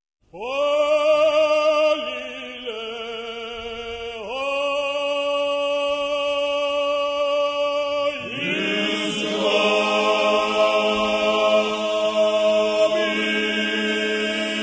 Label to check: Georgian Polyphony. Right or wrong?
Right